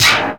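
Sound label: percussion fx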